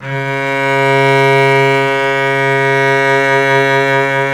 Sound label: SOL CEL 5 L, Synclavier Strings; ILIO